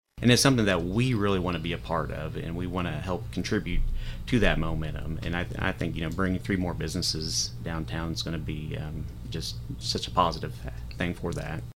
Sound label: Harp